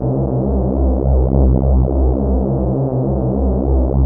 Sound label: Atmo Pads; Best Service; XX Large